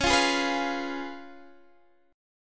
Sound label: C sharp 7th C sharp, Guitar Open D